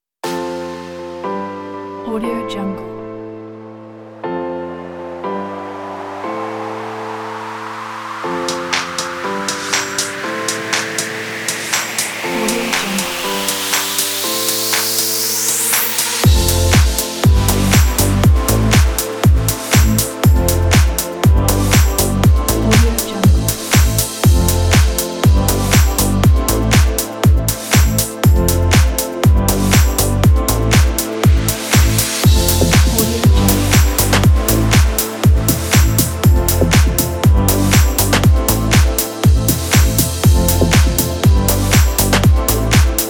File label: deep house